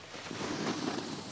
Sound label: Snow Board Walk